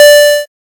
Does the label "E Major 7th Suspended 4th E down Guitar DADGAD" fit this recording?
No